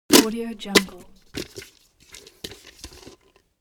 biker helmet put on

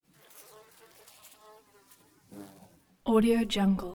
wasp

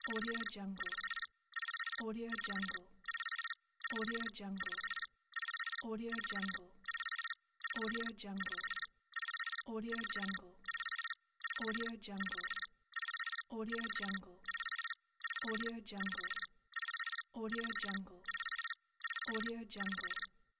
warning alarm system

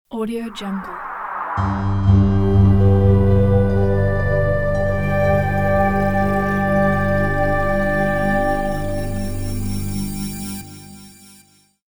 freeze scifi intro; fxprosound

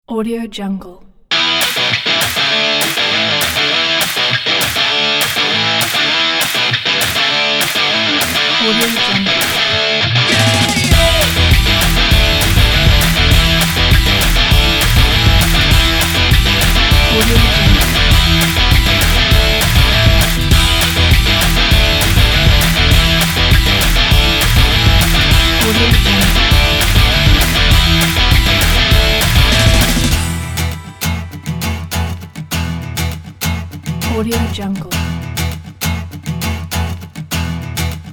energetic indie rock

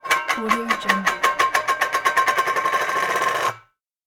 spinning small metal lid

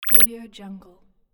growth

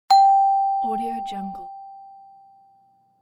right answer

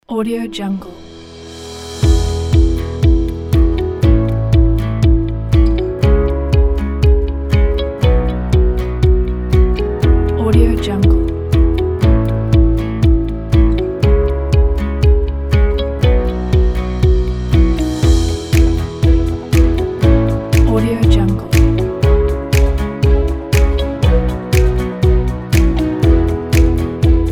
background corporate

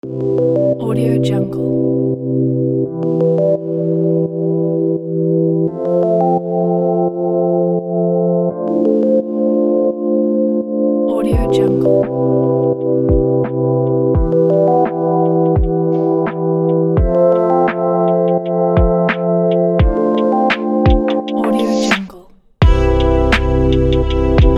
lofi style

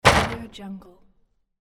dumpster lid closed

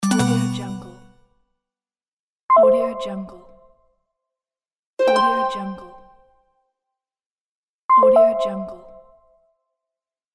buttons menus

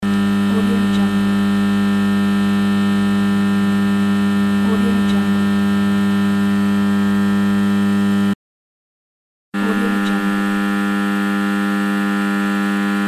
electric voltage

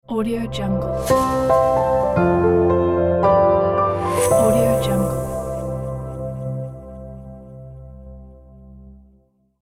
airy piano logo

